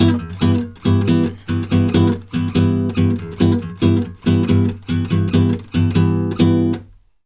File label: BOSSA G; USB Soundscan; AKAI; Brazil Bossa